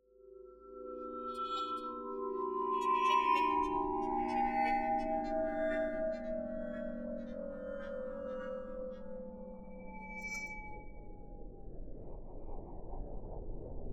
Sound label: Inside Track Long Swirls